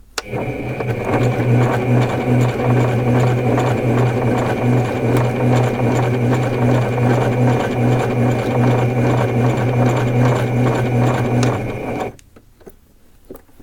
sewing machine; electric

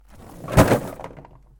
refrigerater; cooking

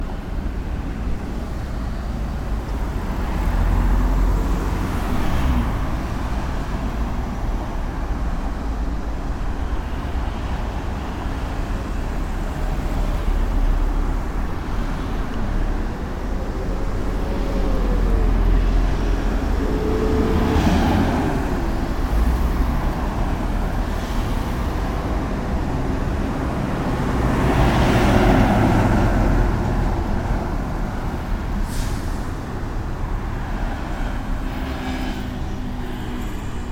city road

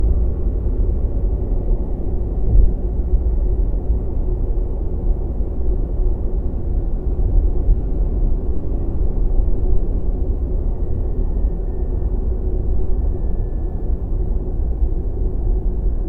freeway tunnel; car

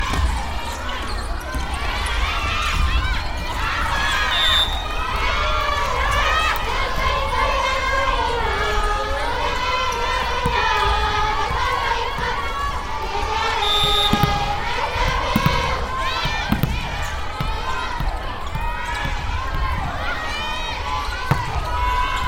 valleyball girls; sports

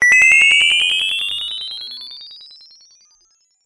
anime flying

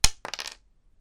drop Shogi piece

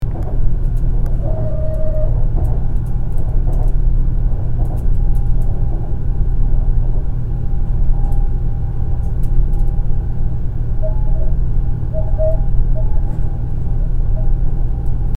on a bullet train

